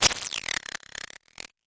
Thin Broken Grit Sweep

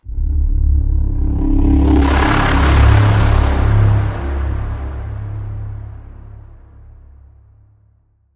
Bend, C sharp

